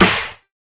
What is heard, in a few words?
Subtle Reverb Snare Single Hit F# Key, snare tuned to the F# note